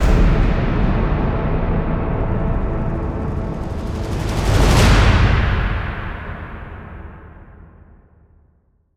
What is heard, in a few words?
Intro Transition; extinction deluxe edition; ps4 windows xbox one